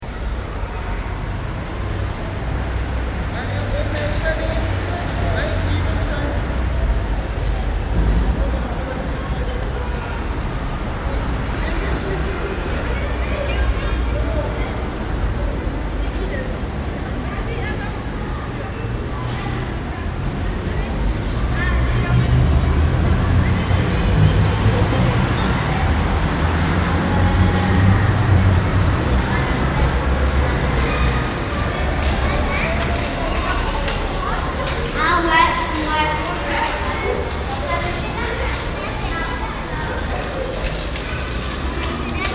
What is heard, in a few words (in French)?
prototypes sonores architecturaux escalier ambigu